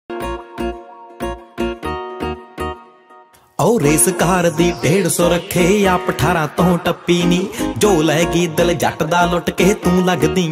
Dance Punjabi

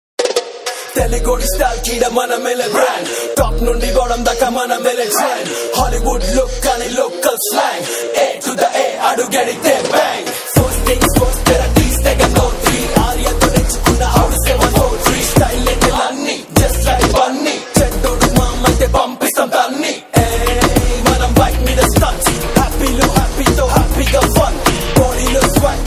RAP Song, Telugu

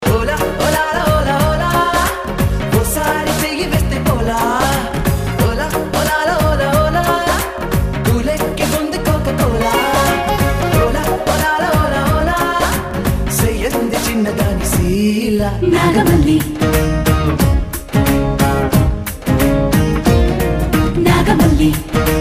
Telugu Ringtone

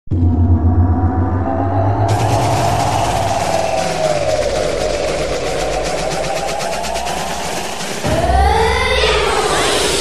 Scary Ringtone; Patapon

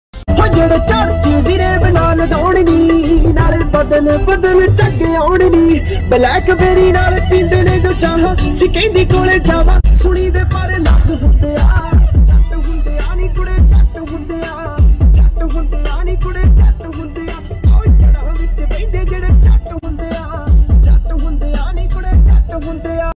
DJ Punjabi Ringtone

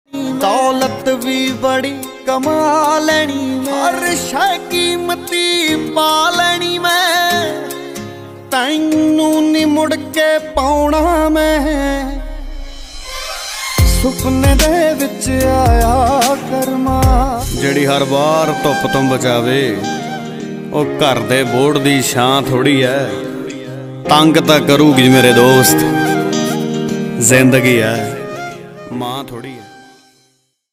Jatt Punjabi Ringtone

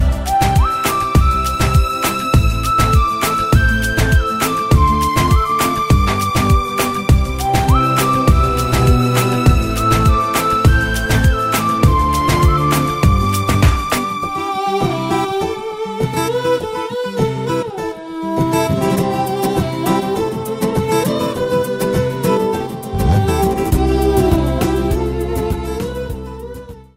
Instrumental BGM Telugu Ringtone; Magadheera

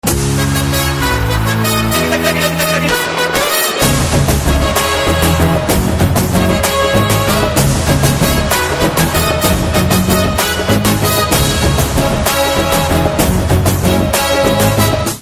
BGM Telugu Ringtone; Krack

